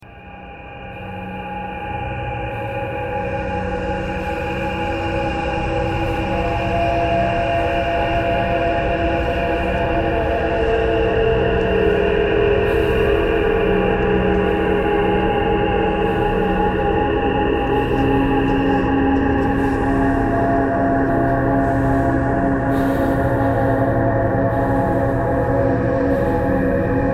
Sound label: thread pentatonic